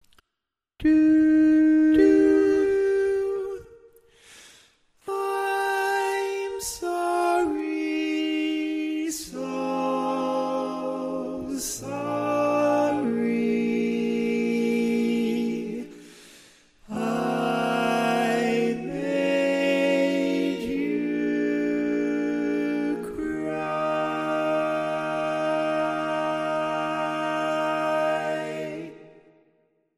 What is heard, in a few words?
Lead, Barbershop Tags